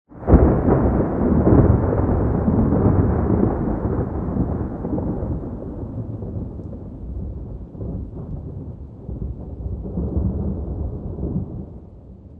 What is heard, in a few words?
rolling thunder; Source Engine; tf; lair